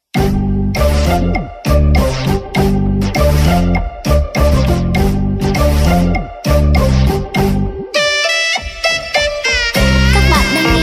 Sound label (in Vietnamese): Dau xot ly con cua beat